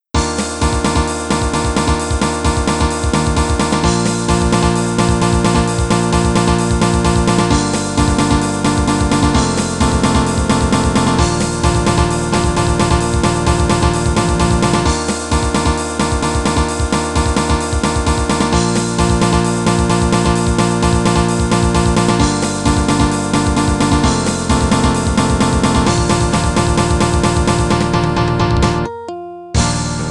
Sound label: emo riff; weekly beats 2020